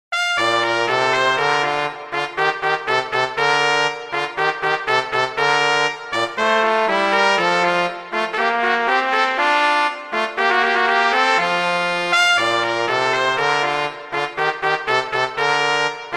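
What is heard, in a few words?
Duet, horn; Mozart; Duets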